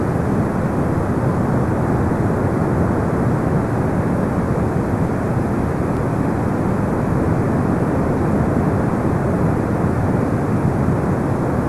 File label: WFR audio emfisis